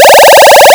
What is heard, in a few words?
shield; skooldaze